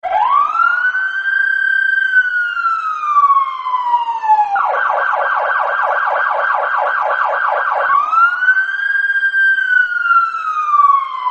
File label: police car